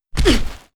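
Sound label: damage, monster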